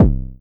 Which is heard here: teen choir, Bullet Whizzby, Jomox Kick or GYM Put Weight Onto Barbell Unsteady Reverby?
Jomox Kick